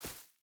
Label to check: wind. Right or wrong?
Wrong